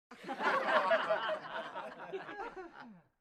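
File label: smeh, laughter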